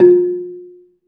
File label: BELA F3, BELAFON, Asian Traditions; USB Soundscan vol.60; AKAI